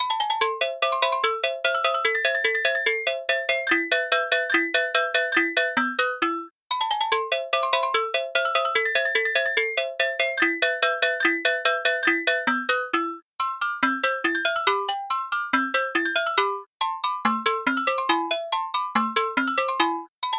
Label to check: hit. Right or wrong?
Wrong